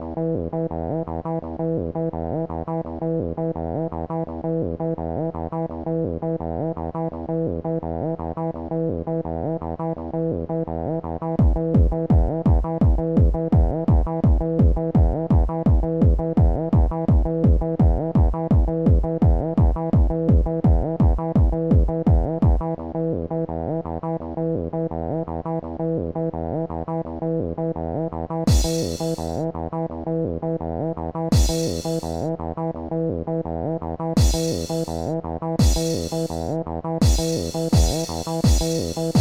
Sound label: 303 loop track; OctaMED